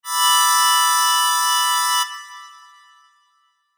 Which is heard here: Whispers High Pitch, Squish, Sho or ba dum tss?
Sho